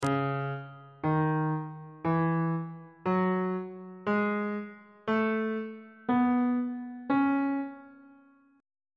c sharp minor key signature on bass clef

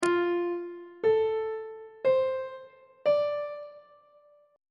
f major 6th chord intervals; on treble clef

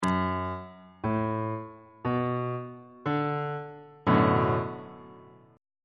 a double sharp minor 7th 2nd inversion

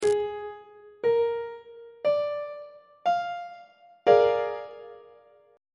b flat dominant 7th 3rd inversion on treble clef

